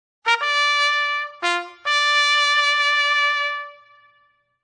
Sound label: Attention, Army